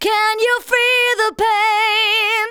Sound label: VOCALS SUNG; Techno Trance Essentials